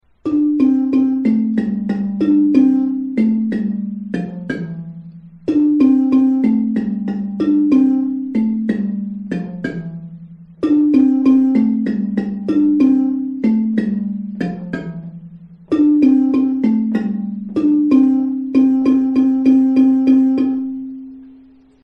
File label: bari pre chorus, marimba